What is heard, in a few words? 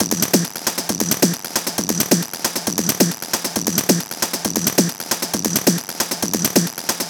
Perc Loop 135, Techno